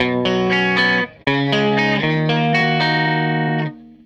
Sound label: Tele Guitar Loop